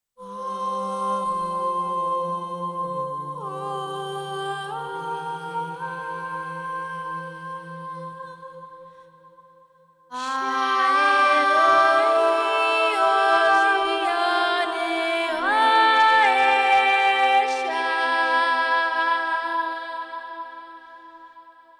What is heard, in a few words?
Voices Phrases Major; NADA Voices; Voices of Silence